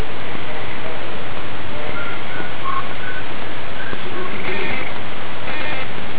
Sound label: whistling